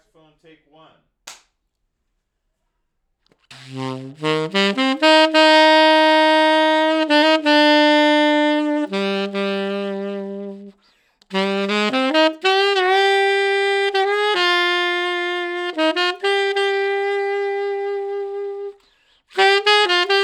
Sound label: sax